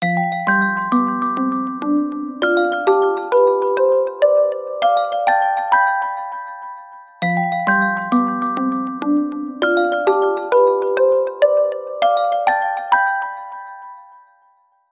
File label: Space, ringtone; xiaomi